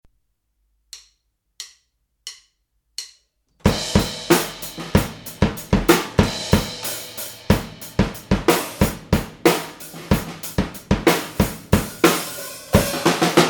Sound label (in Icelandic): trommur